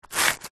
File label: industrial sand paper single sand plastic rub